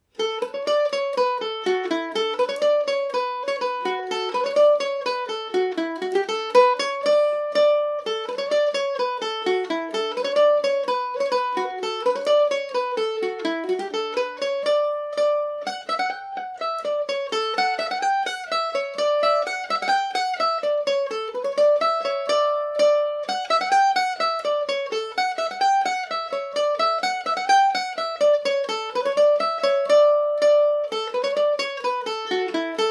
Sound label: nemesis dmaj, mandolin; The Nemesis; G&O #34 mandolin